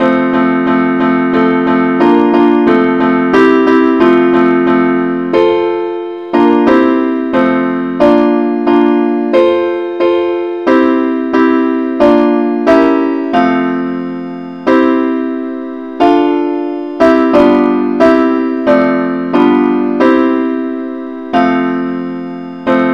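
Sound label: simple accompaniment, key F; christe Sanctorum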